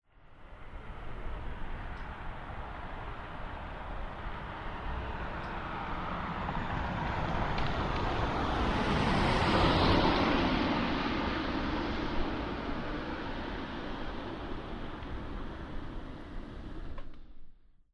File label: car